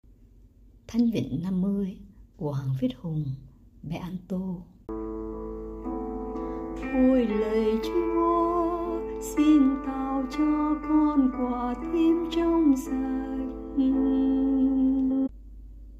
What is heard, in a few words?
Alto